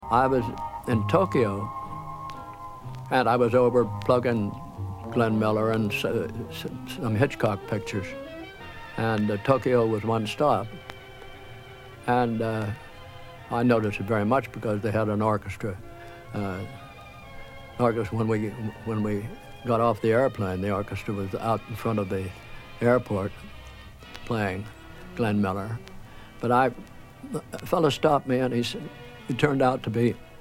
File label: Japanese love music